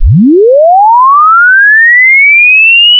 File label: chirp